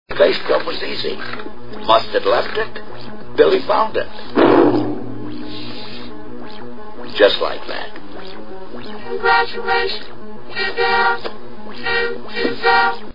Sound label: bassdrum